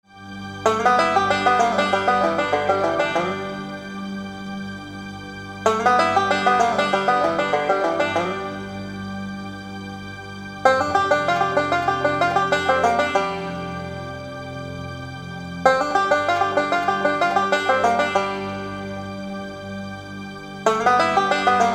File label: Barn Dance Drama